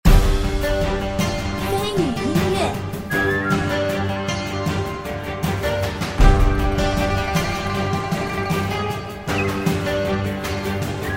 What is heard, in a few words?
PVP; Meng Jiang Hu